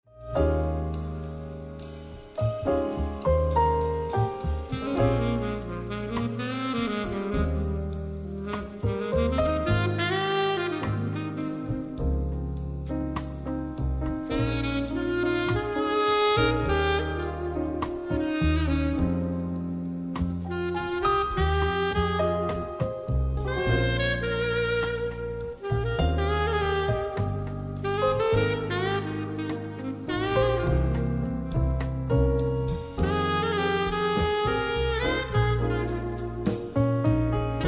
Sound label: Jazz Lounge Sax; Genre: Blues.